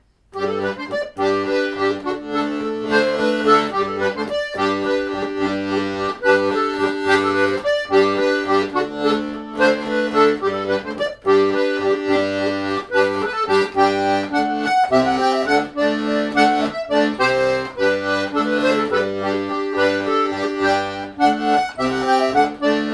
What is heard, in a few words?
English Folk Music; EFDSS; hornpipes; Rusty Gulley